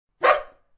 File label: dog